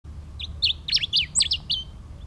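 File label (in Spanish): Diuca, Diuca Común (Diuca diuca)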